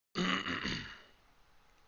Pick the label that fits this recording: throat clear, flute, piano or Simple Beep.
throat clear